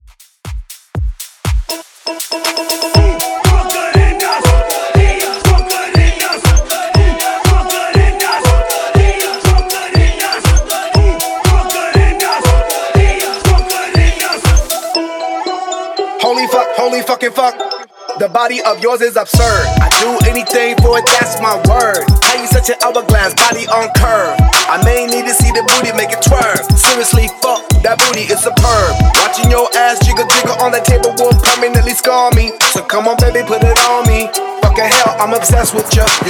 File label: Top40s Dance Transition 120 104 BPM